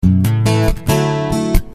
Punchy A,D

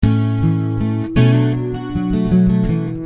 Punchy A,Bm 80

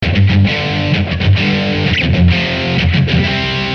G3 129 rhythm

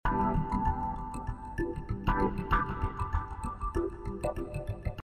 Swinglefunk C

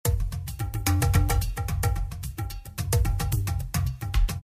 Tabla 110; Tabla Guys

